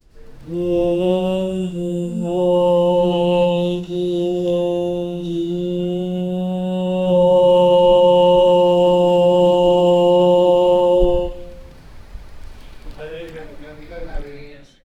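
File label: ancient iranian non muslim, culture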